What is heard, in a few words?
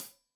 Hat Tight, Hi-Hat, Cymbals; Deeply Sampled Drums